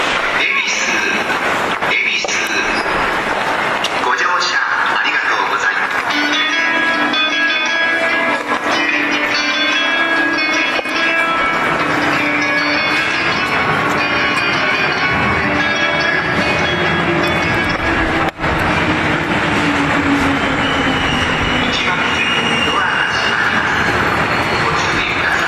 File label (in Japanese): 恵比寿駅, 山手線; 品川・東京方面; まさに「ヱビス」…